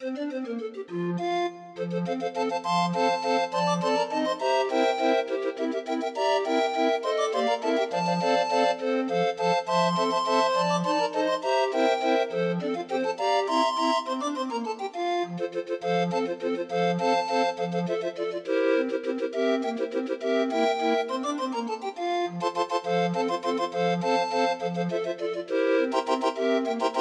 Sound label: La Cucaracha Waltz, grind organ